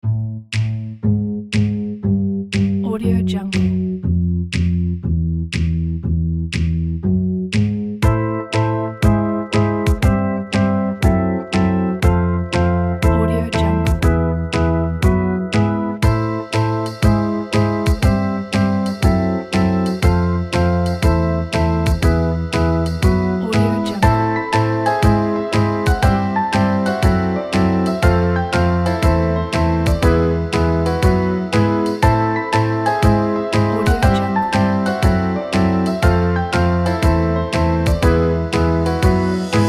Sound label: jazz comedy